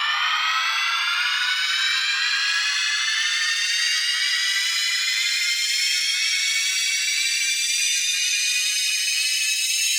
Charge

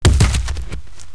Totem Die; Seer; GamigoNA

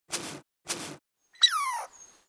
Otter idle

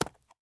Pegasus Footstep; Seer; GamigoNA

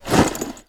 Windy Giant Stand

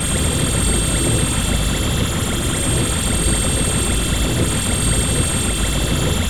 Stone Idle; Seer; GamigoNA

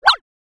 Bunny run; Seer; GamigoNA

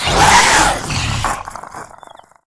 lizard man knight die

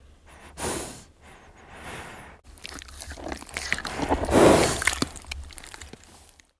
Rein Deer idle

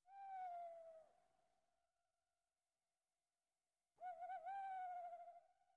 Night

